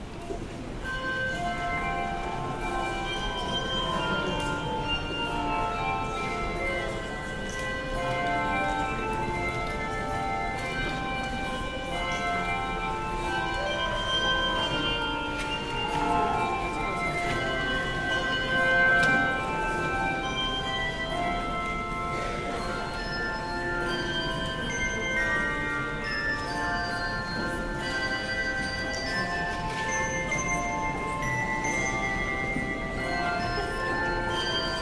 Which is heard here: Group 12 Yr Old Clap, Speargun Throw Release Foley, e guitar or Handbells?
Handbells